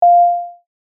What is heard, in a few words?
short punchy sine wave ding, F